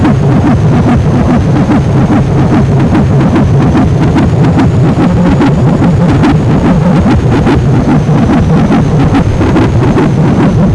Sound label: baby heartbeat